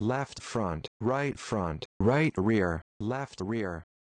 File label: quad test